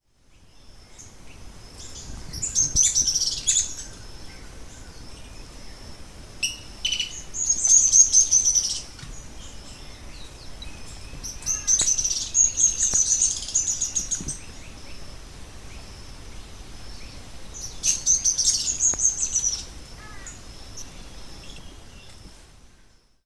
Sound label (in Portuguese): Cranioleuca pallida Arredio pálido